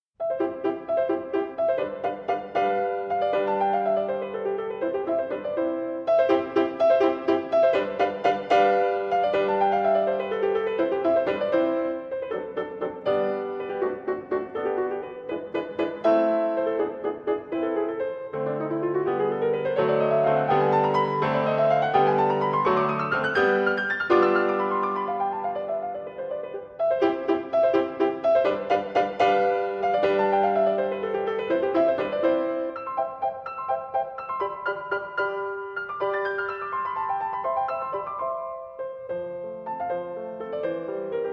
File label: Piano Sonatina in C Major, Vivace